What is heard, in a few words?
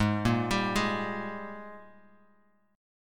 Ab Major 7th Suspended 2nd Ab down Bass Standard; arpeggiated; JGuitar